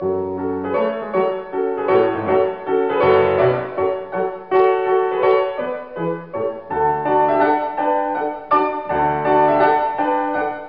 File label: Piano, Piano Sonata in E major; Counter-Strike: Source